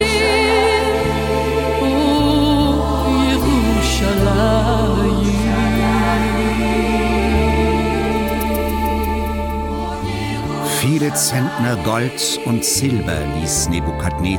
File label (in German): gesang der klageweiber